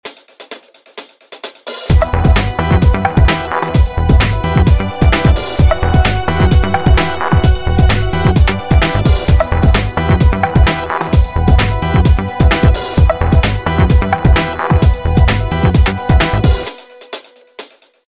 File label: Action Signature, ringtones; TCL S300T